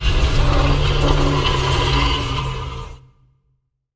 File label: Just Metal